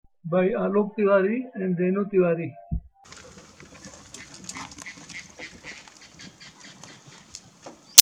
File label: Indian spot billed duck; alok